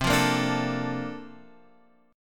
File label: Bb Major 7th, C; Bbmaj7/C; strummed; JGuitar